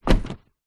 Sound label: household plastic recycle bin lid down